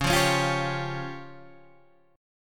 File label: C sharp Major Flat 5th, Guitar Standard; strummed; chord; 9,8,11,10,8,9; JGuitar